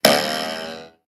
weird, sfx

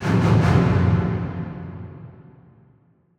Stabs Strings Low, Horror Strings

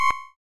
retro beep; sci-fi sfx

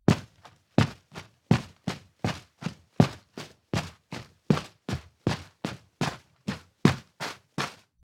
Carpet Footstep; horror; horror sfx vol 1

